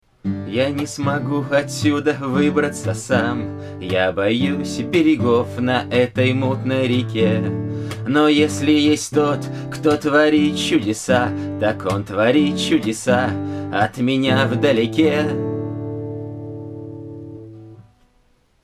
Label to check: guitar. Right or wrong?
Right